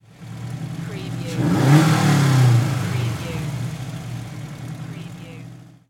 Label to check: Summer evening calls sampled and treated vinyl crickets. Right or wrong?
Wrong